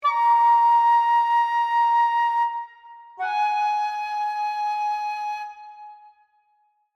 Musical Japanese, Oriental, Flute, Accent; Musical Sound Design; BlastWave

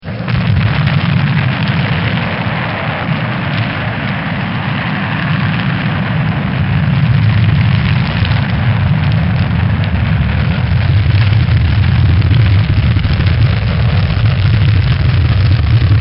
Space Shuttle Lift-Off; Transportation Sound Effects

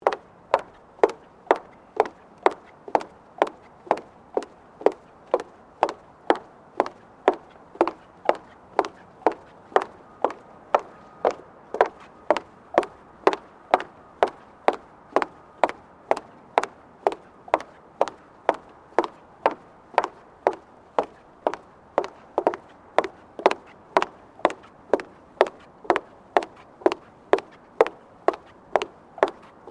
Footsteps Womens High Heels Boardwalk Sequence; Footstep Foley; Human

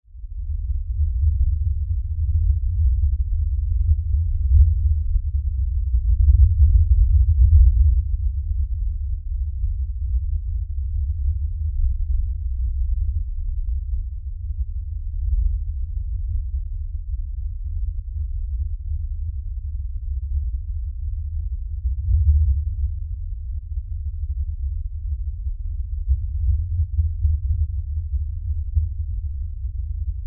LFE Rumble, Lfe Rumble Low Bass; Blastwave; Sound Design

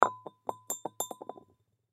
Wine Bottle Down, Wine Bottle Set Down Wobble; Foley; Drink Mixing; Blastwave

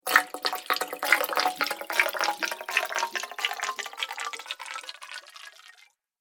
Water Bubbling Echoes, cartoon; Cartoon Sound Design Sound Effects; Andrew Fleming

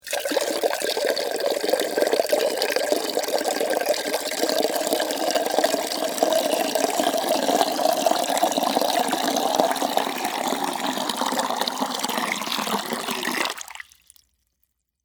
Water Pour Small Pot, Into, Metal, Antique, Long; Pouring Sound Effects; BlastWave

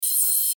Static Short High Hiss, Static Hum Crackle; Production Element Imaging Element Accent Transition; Blastwave; Static Sound Effects

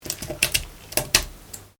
Organic Wet Splat, Organic Foley sounds; Various Organic Foley sounds; Blood and Guts; Horror

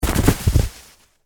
Object Roll Snow, Weather, Object, Roll, In, Snow; BlastWave

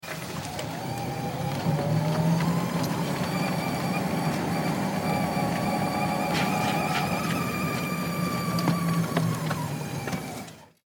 Sports Golf Cart Drive

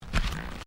Footsteps Ceramic Tiling Step; Crocs; Footstep Foley; Footsteps (Sound Effects:Human)

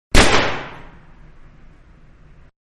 Guns-4 Inch 38 Special-One Shot; Pistol; One Shot Alternative

